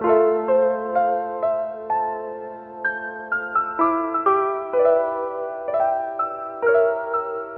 piano, sad loop

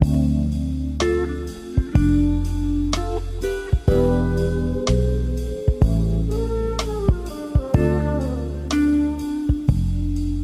guitar, loops

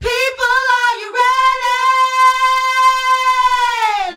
"people are you ready", SUNG; Voice; introductions

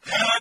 "huh"

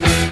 pluck, Guitar; Notes

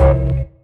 whistle stop

